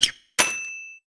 SFX Play Menu Open; pangya fantasy golf pc

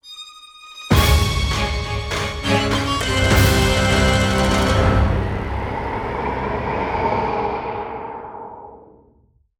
Failure's Horn; Black Reliquary

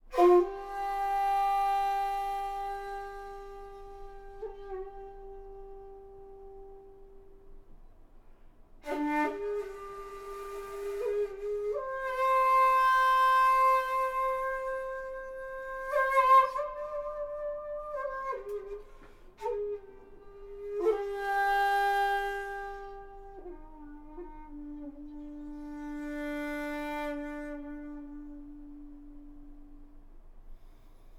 Shakuhachi Meditation; Oriental Empires

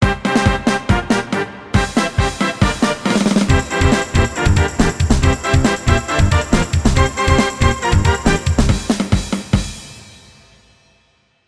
Battle Draw; bomberman remake; bomberman 94 remake

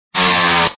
saw

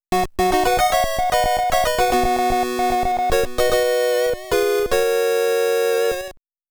Start Fanfare BGM

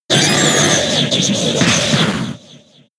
Chatter; Pokemon; gen 6; XY; ORAS

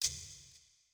UI cinematic dialog forward

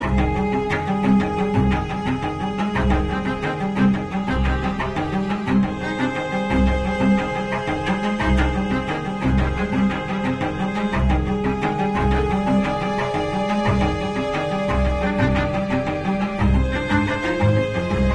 Emotional Tense Loop

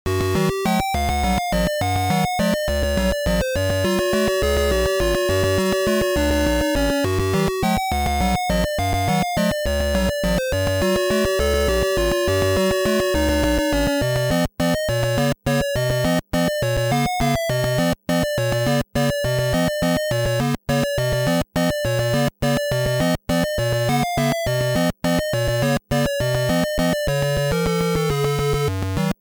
(Field - Food Empty)

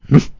Pig Idle; Angry Birds Space

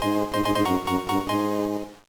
Fanfare Item Get; Pokemon LeafGreen; gba

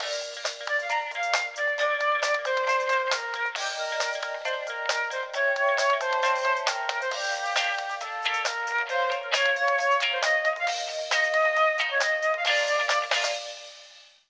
elevator music; chibi robo photo finder

